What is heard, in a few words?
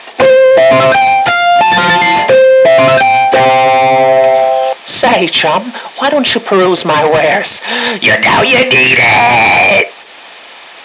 vend; ambience